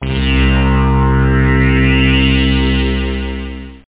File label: analog syn; OctaMED SoundStudio V1; jazzies samples